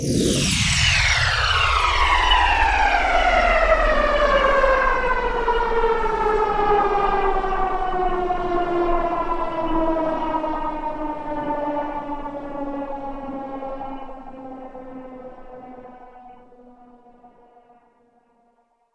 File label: Rain hitting yurt roof